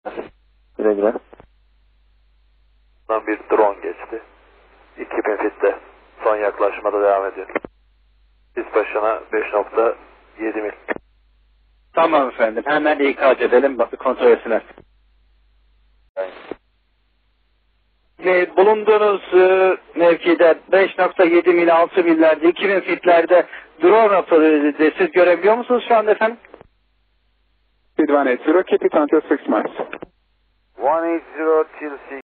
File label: drone